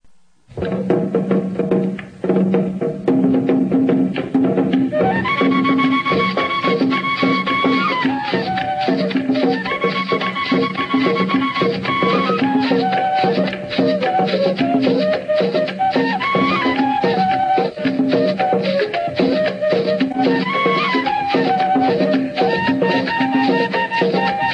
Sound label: cumbia